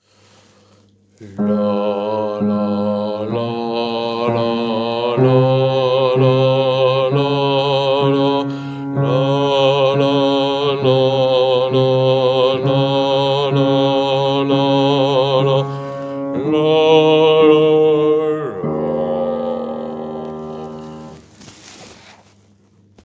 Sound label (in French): basse